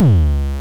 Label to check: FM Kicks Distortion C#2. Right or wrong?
Right